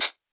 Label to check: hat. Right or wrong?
Right